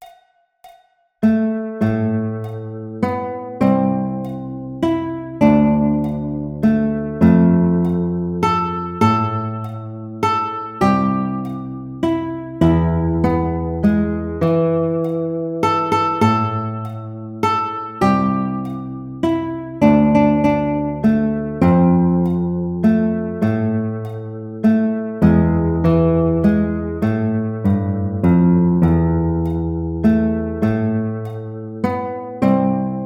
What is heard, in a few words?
House Of The Rising Sun Alto, alto guitar